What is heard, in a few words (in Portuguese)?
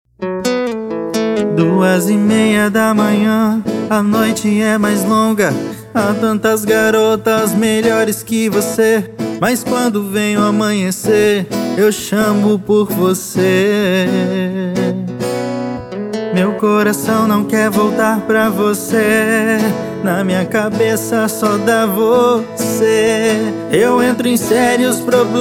sertanejo sofrência